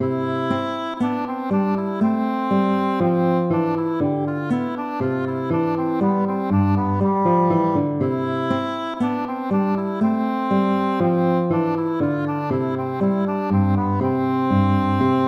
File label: allegro; hook james